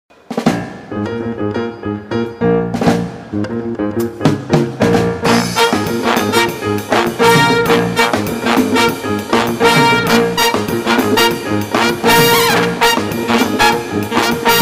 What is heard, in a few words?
Samba, orchestra; Blackpool 2023